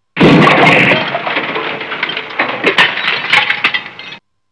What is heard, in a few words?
lose; tuxtype